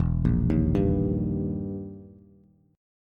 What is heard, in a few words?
E Minor Major 7th Flat 5th, Bass; 0,1,1,0; arpeggiated; JGuitar